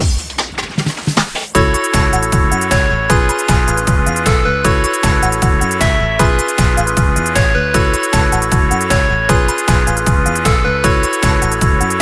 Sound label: Instrumental